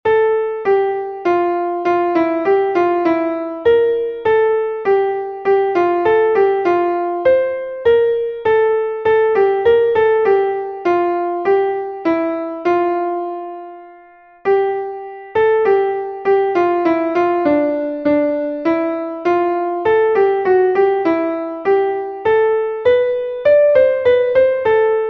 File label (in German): klavier; MelodieMeister